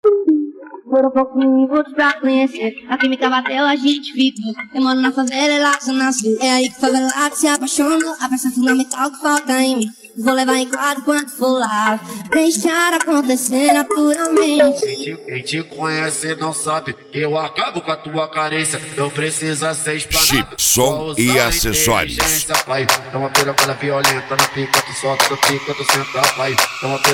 especial funk; som e acessorios

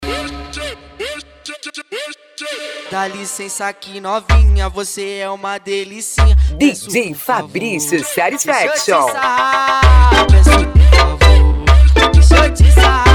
funk mega bass; reboque rubro negro; carreta rubro negra

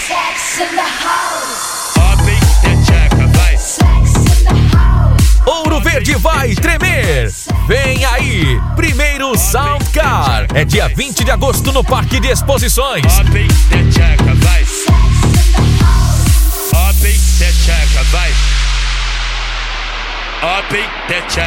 sound car

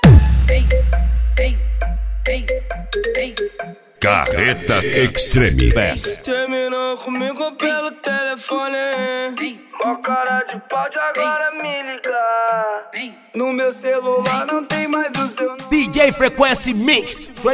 extreme bass; carreta

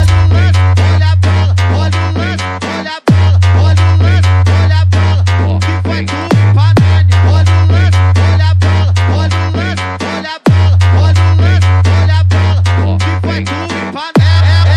funk bass

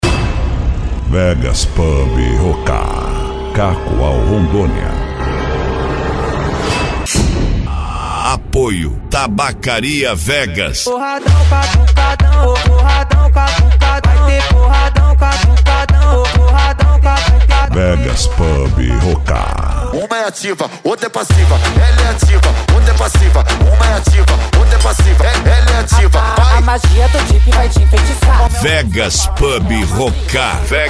funk mega bass

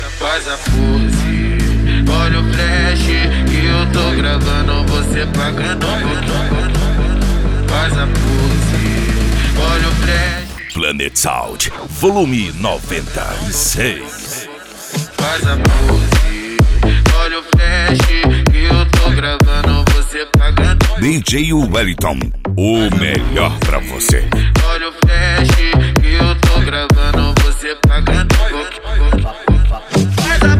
dance deep house; planet sound vol 96

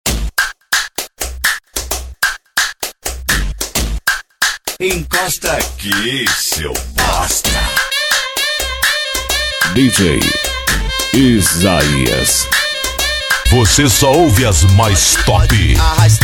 funk bass automotivo

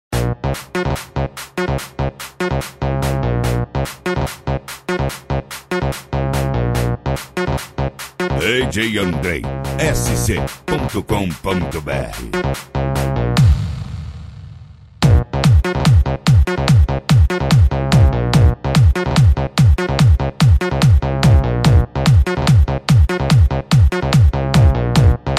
pancadao funk bass

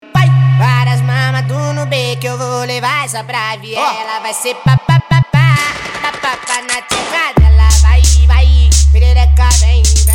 bass; bluster alto falantes

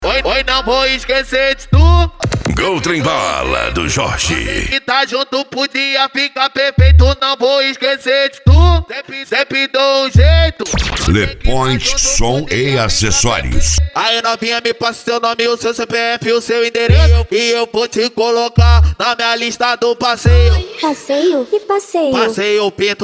funk mega bass

